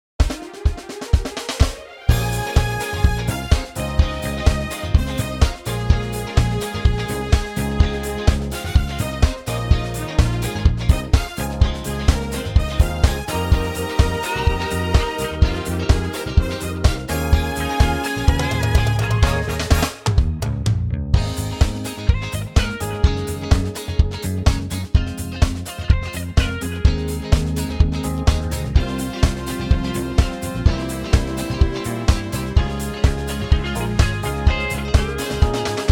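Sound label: Ab, Backing Tracks for Male Vocals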